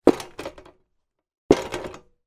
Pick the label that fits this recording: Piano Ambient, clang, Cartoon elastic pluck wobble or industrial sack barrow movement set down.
industrial sack barrow movement set down